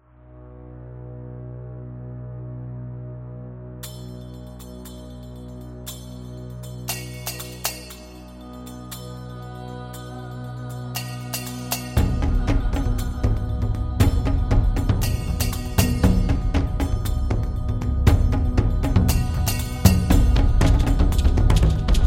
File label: Chinese Drum; chinese new year